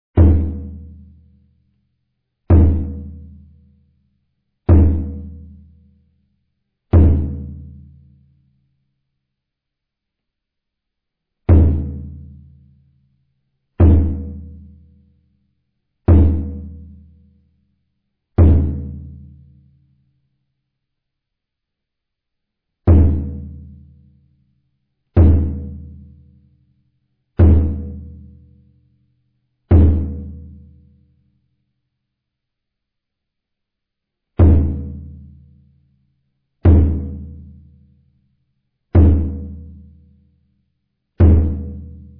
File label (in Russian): Киокушин барабан